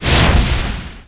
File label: Tower Fireball; DunDef